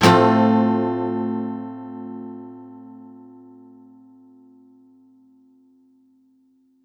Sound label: Guitar 128 BPM Fmaj, One-Shots; Ghosthack Advent Calendar 2021 - Day 10; Guitar Loops and One-Shots